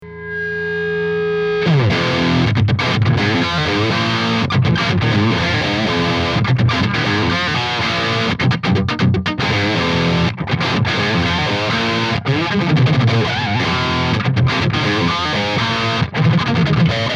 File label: jcm800 crunch, gtar